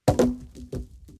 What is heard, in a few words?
wood hit